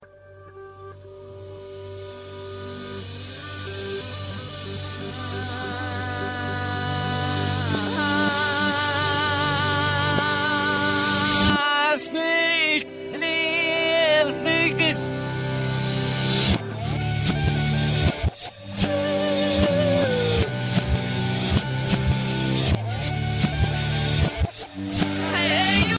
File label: backwards music